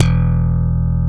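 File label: PRE-SLAP E2, bass; Drums In Your FACE!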